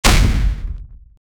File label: powerful and heavy hitting, blunt weapon